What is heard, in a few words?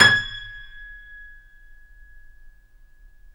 KEY, Steinway ff